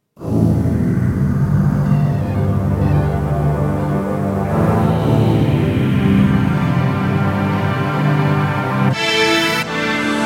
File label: Suspense, Kawai K1 preset